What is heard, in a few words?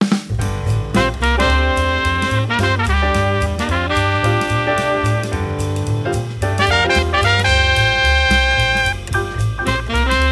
Modal Jazz